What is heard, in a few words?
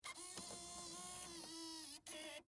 HD Camera Load, Hd, Camera, Load, Cassette, Tape, Sony, Hvr-Z5; Technology; BlastWave